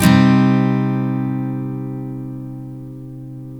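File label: steel C minor up, CHORDS STEEL, Guitars; Spectrasonics; Hans Zimmer Guitars VOL-1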